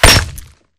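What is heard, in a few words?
Bullet Light Ballistic Concrete impact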